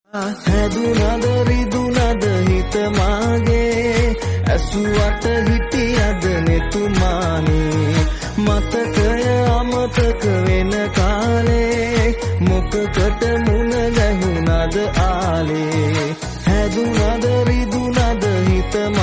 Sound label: sinhala song